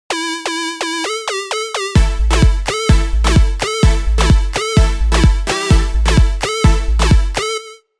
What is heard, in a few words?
Oranje Pulse, Sylenth1 dirty dutch leads; revealed sylenth1 dirty dutch leads vol 1